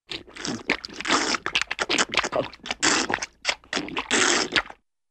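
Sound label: Funny Animal Drinking with sloppy Lapping; Cartoon; Hollywood Edge; Cartoon Trax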